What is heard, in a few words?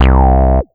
Ensoniq ZR-76 303 Bass C2